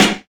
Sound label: natural acoustic snare sample g key; snare drum samples